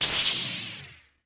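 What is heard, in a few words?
lightsaber jedi on; weapons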